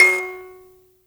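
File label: buzz kalimba F#4; Heart of Africa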